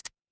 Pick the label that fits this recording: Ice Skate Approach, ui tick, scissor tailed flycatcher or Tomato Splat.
ui tick